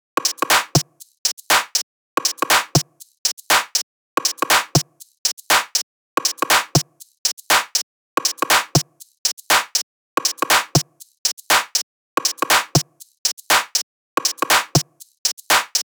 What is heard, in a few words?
tops 120, Drum Sample Pack Loops Top Loops; SD SEISMIC; Slate